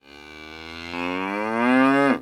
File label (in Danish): Ko Muh; DYR